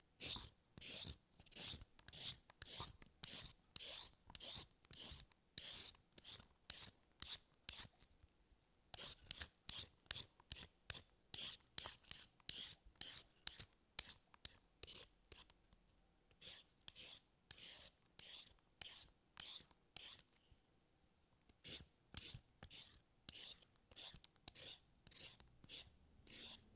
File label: Peeling Veggies; Cooking